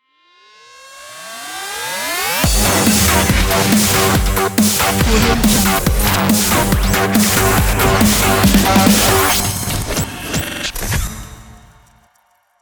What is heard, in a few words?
dubstep glitch logo